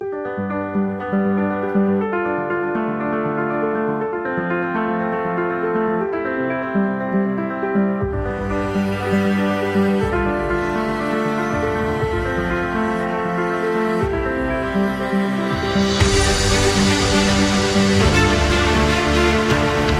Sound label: Award Celebration